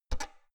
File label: oven button press squeaky